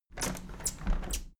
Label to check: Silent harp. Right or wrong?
Wrong